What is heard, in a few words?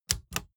typewriter